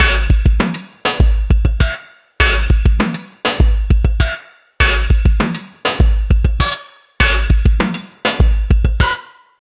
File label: experimental drum loop